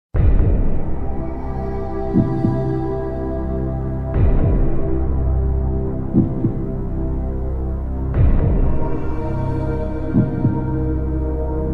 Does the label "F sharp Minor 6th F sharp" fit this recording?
No